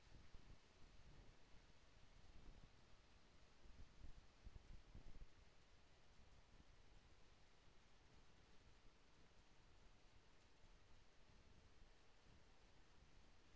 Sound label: HYDROPHONE 16000Hz; JASCOAMARHYDROPHONE